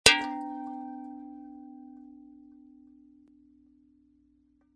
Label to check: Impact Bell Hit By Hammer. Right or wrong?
Right